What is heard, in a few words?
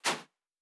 Footstep Sand Running; Character Sounds